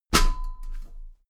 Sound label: step metal ladder step top heavy; schritte